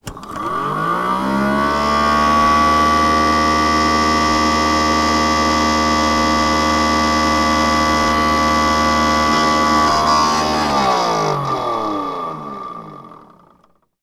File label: industrial machine motor small on run off; sound effects one